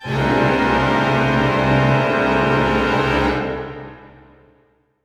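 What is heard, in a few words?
orchestra; Masterbits - Switch FX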